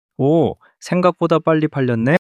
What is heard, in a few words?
surprise